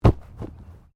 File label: Body movement Fall Heavy